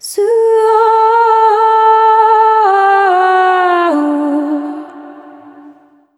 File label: Vocal Uhhha D